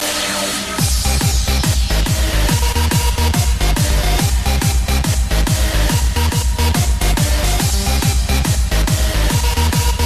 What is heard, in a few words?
Tecktonik